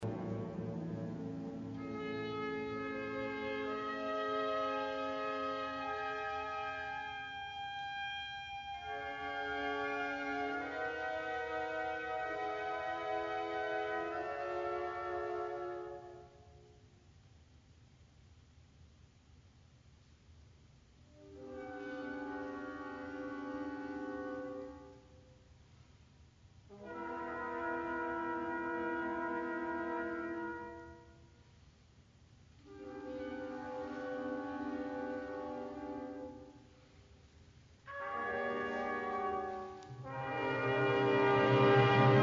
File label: Fanfare excerpt